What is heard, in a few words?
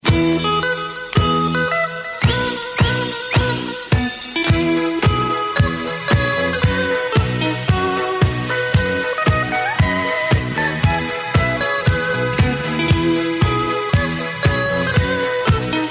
Tiroler Music